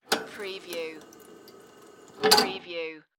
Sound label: Drinking Fountain; Sound Effects Bible